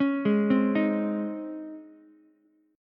C sharp Major 7th Suspended 2nd, Ukulele; C#M7sus2 arpeggiated; 6,8,8,6; JGuitar

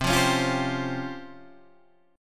Db Major Sharp 11th C

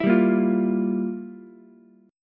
F Major 7th Suspended 2nd Suspended 4th, Ukulele; strummed; 9,7,6,8; JGuitar

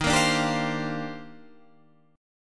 D sharp Major 13th D sharp Guitar DADGAD, chord

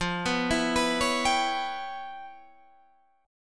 E Minor 6th F, Em6/F chord; arpeggiated

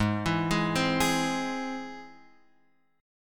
Ab Augmented Ab, Guitar, Open F; Ab+ arpeggiated; JGuitar

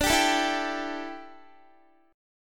D Suspended 2nd Suspended 4th D down Guitar

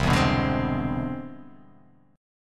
C Augmented Major 9th; strummed; JGuitar

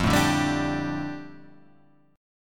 F 6th Add 9th F down Guitar Drop D, chord

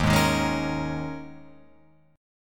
D sharp 7th Suspended 4th, Guitar, Open F; D#7sus4 strummed; JGuitar

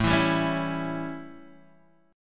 A 7th Guitar Drop D, chord

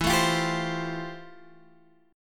F Major Add 9th E; Fadd9/E strummed; JGuitar

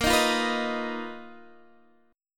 B Major 7th Flat 5th A sharp, guitar

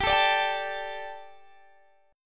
G Suspended 2nd, Guitar DADGAD; chordshape; down

